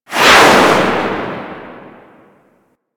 Space Ship Missile Proj By; Warframe; Grineer Pursuit Space Ship

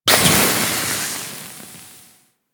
Infested Pistol Gas Burst; Warframe

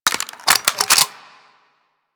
Sniper Reload; Warframe; Tenno

